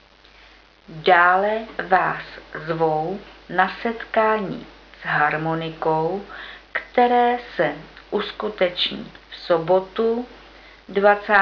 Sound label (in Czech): harmoniky; Pozvání zahrádkářů na setkání s harmonikou; Janovice nad Úhlavou